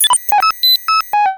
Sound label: Blip